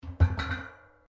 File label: Pipes Metal Impact Tyre; Materials